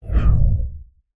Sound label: Menu Whoosh; flatout 4 total insanity